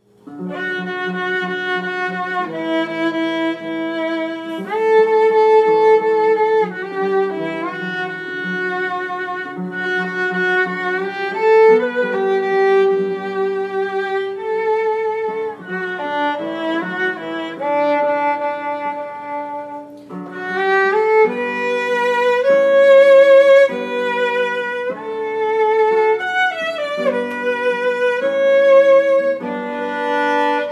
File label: guitar; Guitar & Violin